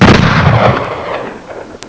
44 magnum; studio

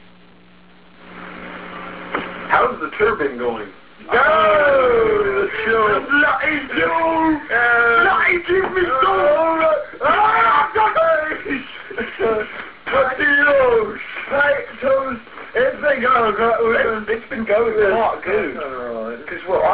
solo

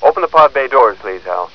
open door

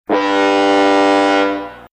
buzzer

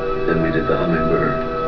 hum; "Then we did the hummingbird" (Laughs)

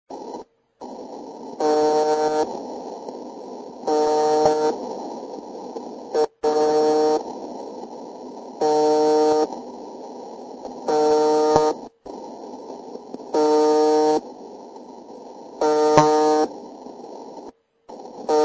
buzzer

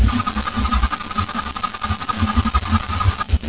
chopper rotorblades; Charlie Chopper; rotorblade spinning sound; vehicle mode

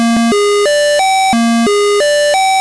increasing tones

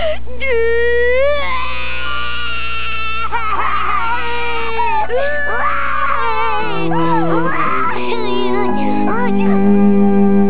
crying; Chuckie Crying; Chuckie Finster

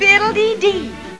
fiddle

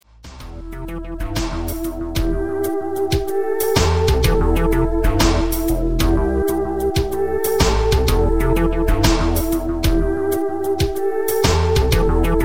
hauntedforest

